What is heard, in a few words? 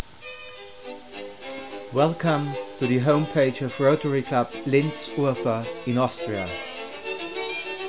Bruckner C Minor; Rotary; Urfahr; LINZ